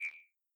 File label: Scifi Inspect Sound Ui Or In Game Notification